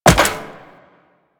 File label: Wall Jump Metal Perforated; Footsteps; Warframe; Tenno; Wall Run